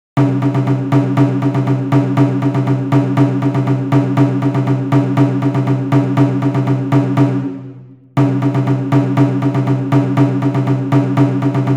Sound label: karamatsu Nagado 120bpm